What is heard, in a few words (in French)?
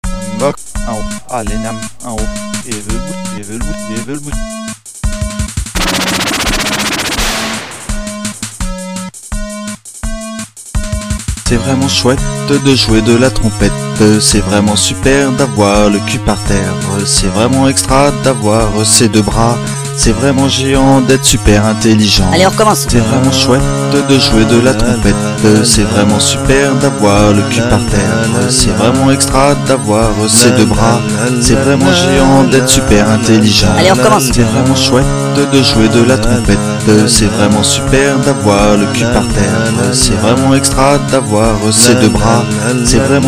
la trompette